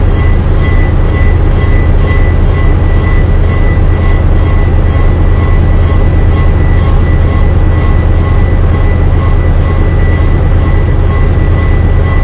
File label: vent rum; ambience; aomdc